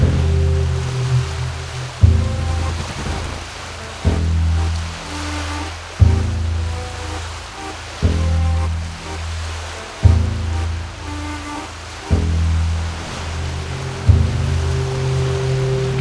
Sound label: Waltz; Kraken; puss soundtrack